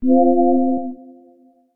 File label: orderLose